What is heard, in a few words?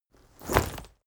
leather armor movement